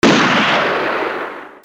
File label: WEAPONS